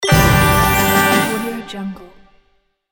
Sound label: level complete